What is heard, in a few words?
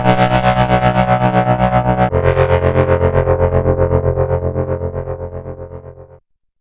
Shruti